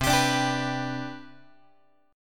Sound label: C Major 7th Suspended 2nd C down Guitar, chord; CM7sus2 strummed; JGuitar